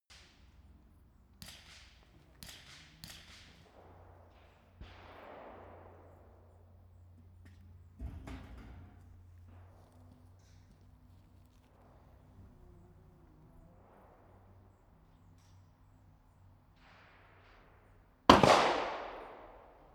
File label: gun shooting range